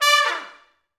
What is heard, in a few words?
Pop Brass, D4; Best Service; ProSamples vol.19; AKAI